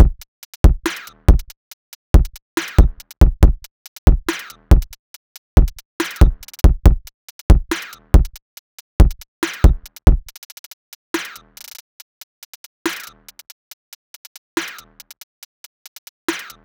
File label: 140 drum loop full beat